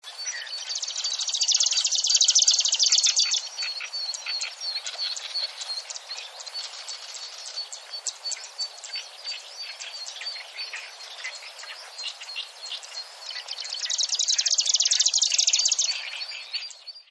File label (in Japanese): osekka